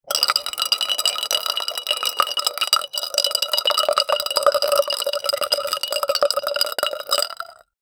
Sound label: ice cubes fall into a, drinks